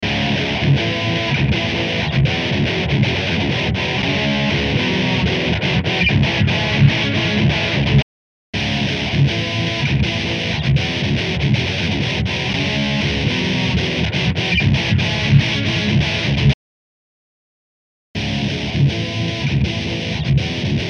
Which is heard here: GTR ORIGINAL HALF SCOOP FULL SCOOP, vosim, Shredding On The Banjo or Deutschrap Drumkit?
GTR ORIGINAL HALF SCOOP FULL SCOOP